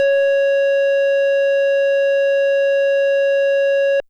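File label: FM Sq Dist DX100 C#1; square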